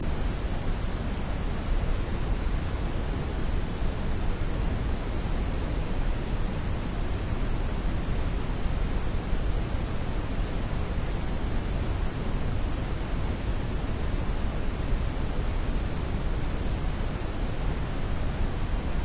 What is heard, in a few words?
Brownian Noise